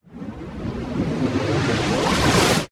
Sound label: Spirit Walk Charge Up; Lurker; Evolve; Gorgon